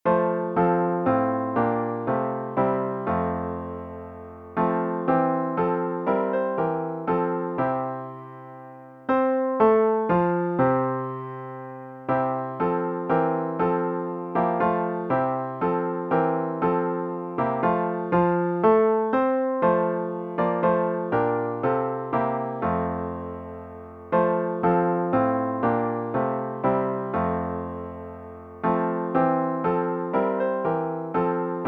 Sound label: piano only; Come Thou Almighty King